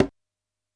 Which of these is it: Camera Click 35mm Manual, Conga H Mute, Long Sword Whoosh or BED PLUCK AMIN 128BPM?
Conga H Mute